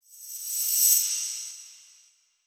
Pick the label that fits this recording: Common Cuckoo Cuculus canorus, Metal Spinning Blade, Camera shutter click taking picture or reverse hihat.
reverse hihat